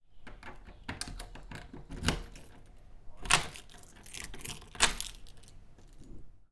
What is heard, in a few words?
Locking Door